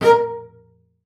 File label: A#4, BASS SPICC; Xsample VOL-5